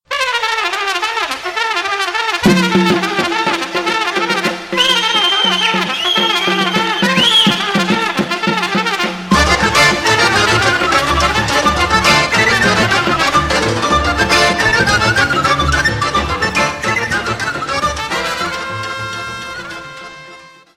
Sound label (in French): BATUTA, Roumanie